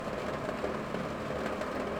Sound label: pulses, BALAENOPTERA; MONACO 2022-07